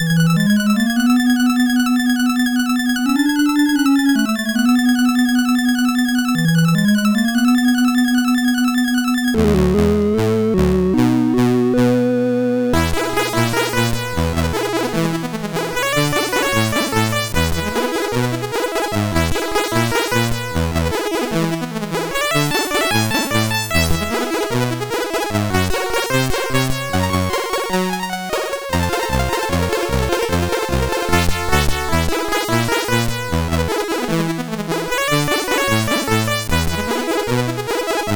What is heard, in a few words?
sid CSG8580R5; Jetsons